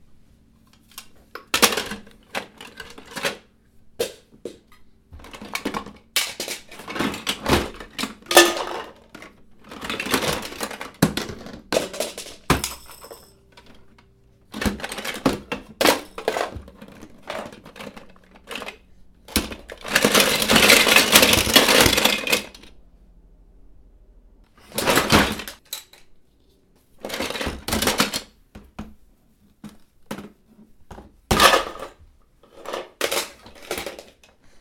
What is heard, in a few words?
baby inbetween lots of wood and playstic toys